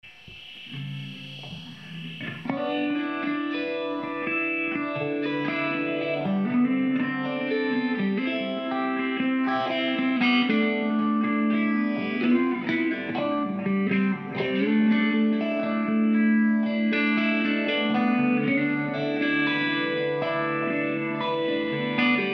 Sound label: Gentle Rain